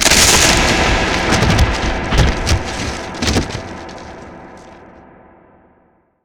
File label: wood break; svencoop